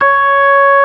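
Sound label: ORG. 3 C sharp 5; Best Service; Advanced Media Trax Modern Composer; B3 FULL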